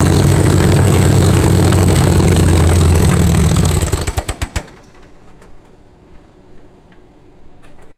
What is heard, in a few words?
vintage aircraft engine shutting off